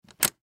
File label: Switch Lever Move; Foley; Switches; Objects; Blastwave